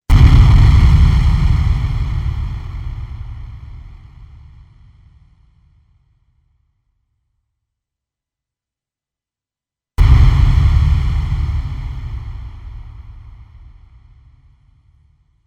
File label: More Explosions